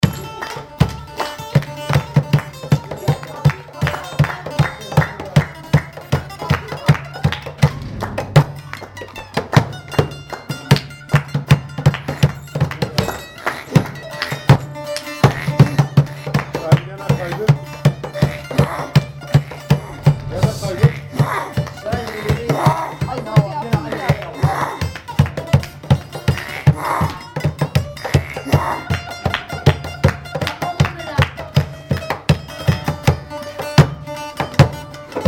Music At Sahins House, Diyabakir Turkey

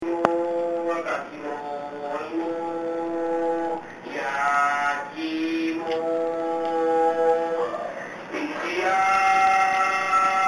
yaki imo

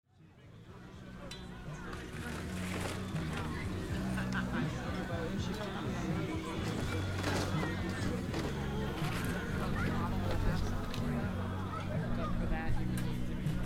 Concord CA Polish American Picnic

